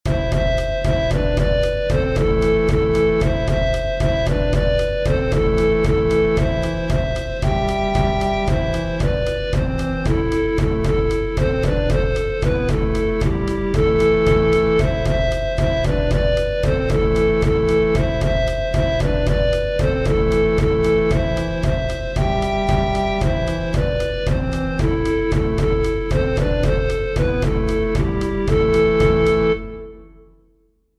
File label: tataquillatas w bass and offbeat drums